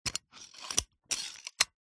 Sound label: office stapler mechnanism metal movement clicks short